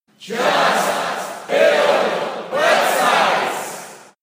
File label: echo